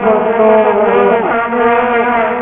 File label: vuvuzela